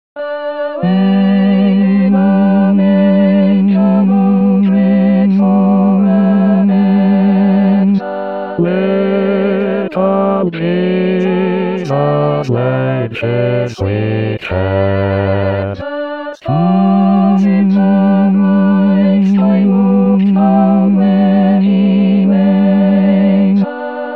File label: Away in a Manger Bass